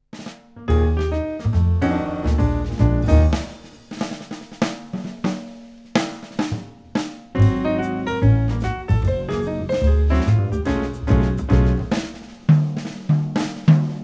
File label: Puppy Walk; ピアノ・トリオ